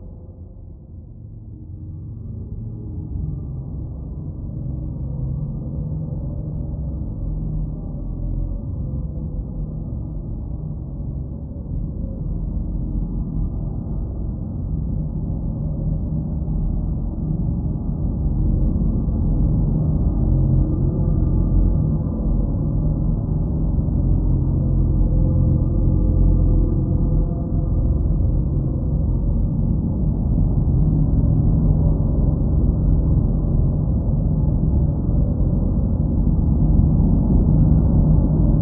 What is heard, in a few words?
Mine Tension; Outlast 2; PS4 Windows Xbox One